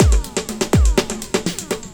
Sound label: Beat, Drums & Percussion; Electrocution; Beats